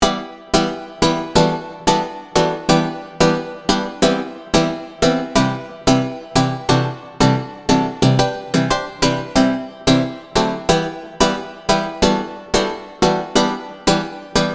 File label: Rhythm Echo